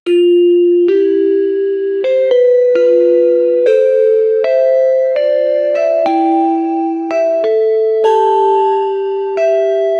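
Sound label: new record mobile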